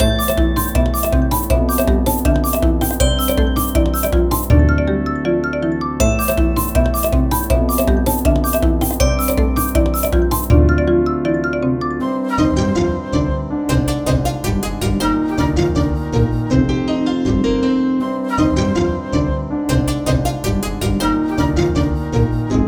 Combat Music

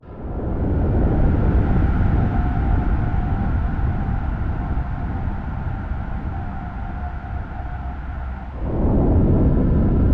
music cinematic; drowning2

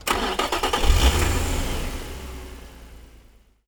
vehicle racing car ignition; teardown; ps5 windows xbox series xs

